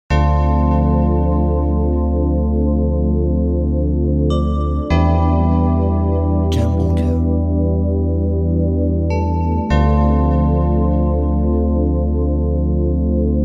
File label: Atmospheric Chillout